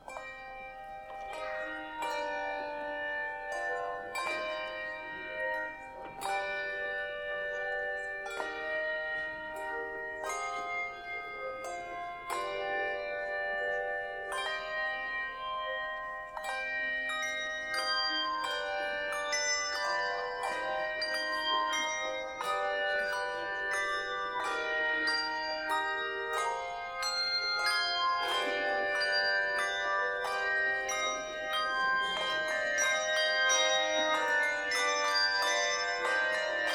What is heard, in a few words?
Communion, Carillons